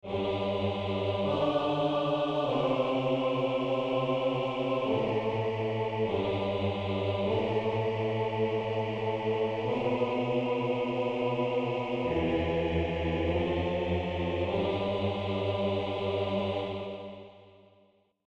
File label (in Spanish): En octavas